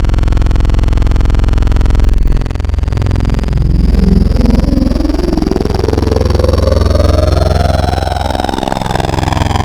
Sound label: Digital Sync Ring; Wavetables; Synthetic's Serum Collection; ESW Core Wavetables; Echo Sound Works Core Tables